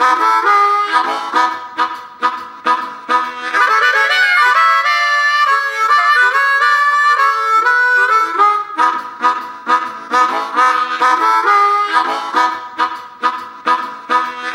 Harmonica

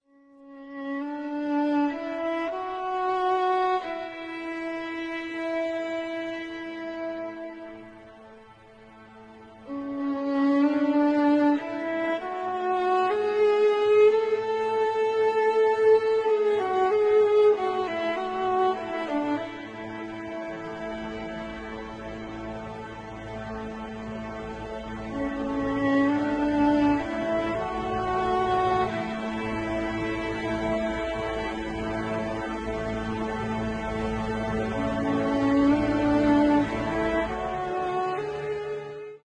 instrumental violin

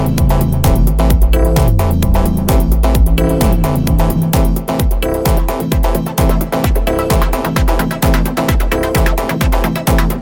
Techno

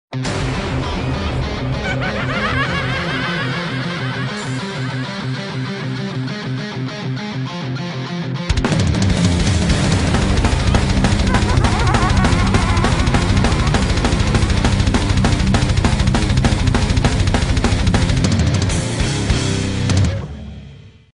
game over

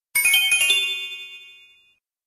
nokia x7; ringtones; sms

